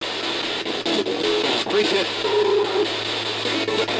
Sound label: growl; Ghost Box